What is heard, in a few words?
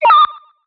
Ojama; SE Ing Common#12 (SE Puy12 Ojama1)